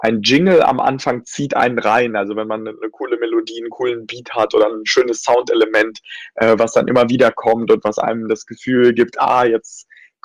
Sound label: jingle; Klickwinkel